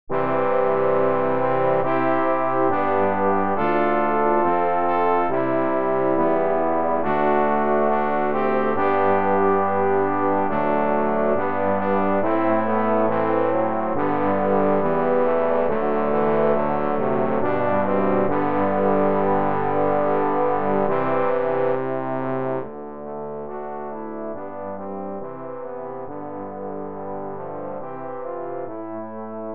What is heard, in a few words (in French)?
quatuor pour trombones